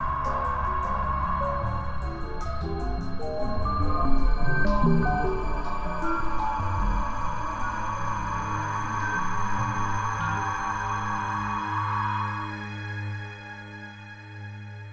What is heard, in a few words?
sounds music